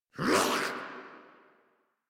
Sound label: Attack Effort; en; Warframe; Grineer; Ghoul